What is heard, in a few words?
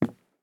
walk wood; Escape from Tarkov